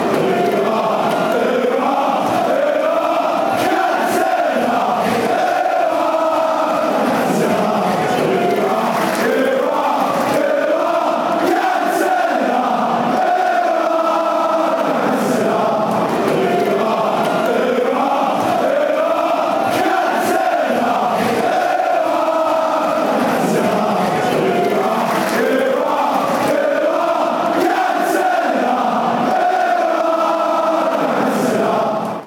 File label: ooh aah cantona, fan chants